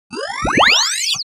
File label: futuristic cinematic sweep